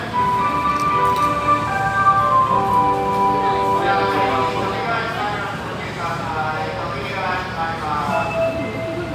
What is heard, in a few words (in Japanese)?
大阪メトロ中央線堺筋本町東発車音